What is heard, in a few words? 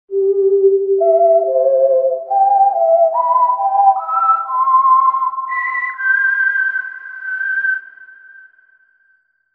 Whistler; TURBO VL